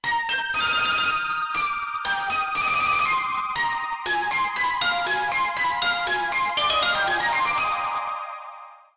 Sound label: mobile phone ringtone